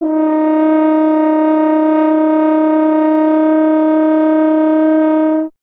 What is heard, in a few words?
F. Horn; Miroslav Vitous; Solo Instruments VOL-2